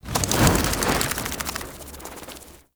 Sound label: Infested K Drive Boost Slow, Vehicles